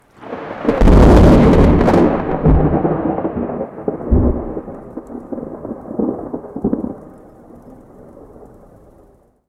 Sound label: sharp thunder claps